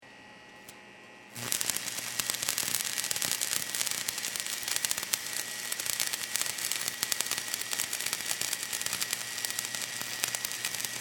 Industry Welder Run Sparks Long; Welders; Machines

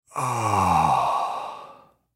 Human Moan; Moan Long Male Fifty Yrs; Pain; Sound Effects:Voice

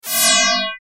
Rollover Beep Tone Multimedia; Web Interactive; Beeps; Blastwave

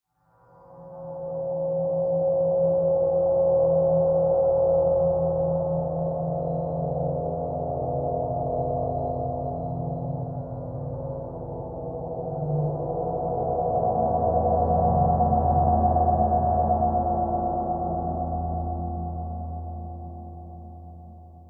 Horror Dark Swells; Scary Tones; Blastwave

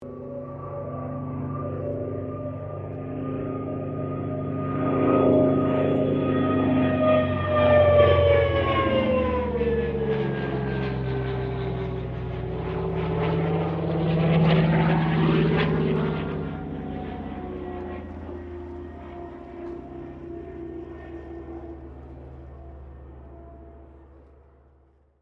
AIRCRAFT CA-13 BOOMERANG PASS STEREO; Airplanes (Sound Effects:Vehicles)

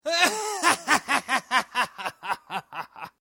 Laugh Male Laughter Laughing Male; human sound effects

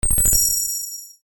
UI Confirm, User interface feedback sounds; Computers (Sound Effects:Electronics); SoundEffects-Foundation4

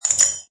Metal Nut quick jar; Metallic; Lynne Music

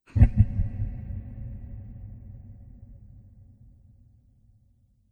Deep Monster Chuckle; Monster Sound Effects

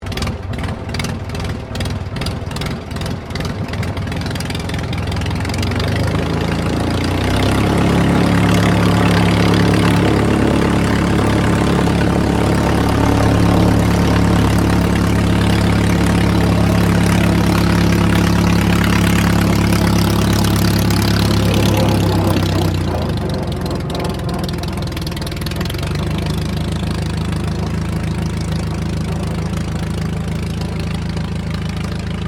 AIRCRAFT DH82A DEHAVILAND TIGERMOTH IDLE TAXI; RAAF Tigermoth; Airplanes; Vehicles

